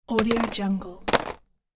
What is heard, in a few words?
gun or object set down